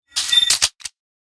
Futuristic gun firing mode switch

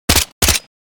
MK2 assault rifle suppressed fire; war; gfxsounds

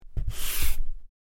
Chalk eraser swipe; Chalk and Chalkboard

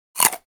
Bite crisp crunch single

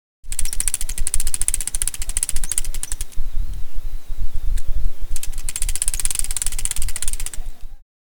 Fly fishing fishing rod reel boat waves ambience

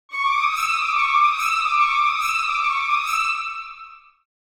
Cartoon orchestral danger

